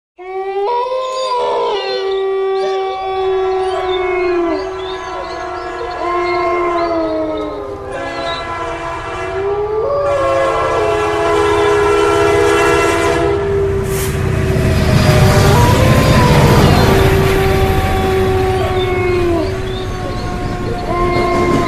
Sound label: night wolf tone; Animals